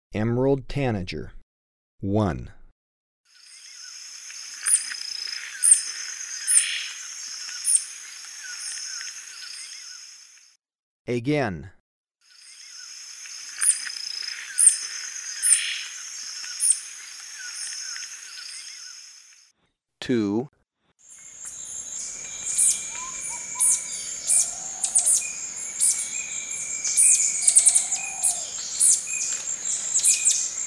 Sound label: Emerald Tanager, Birds of Ecuador; S. America